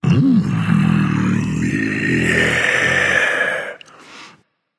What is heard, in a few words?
content, voice; Smite; ymir skin14